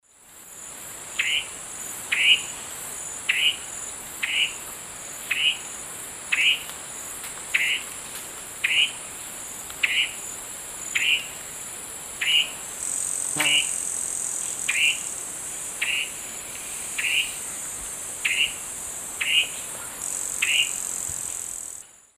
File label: Henicorhina leucosticta, scold; S. America; Eastern Lowlands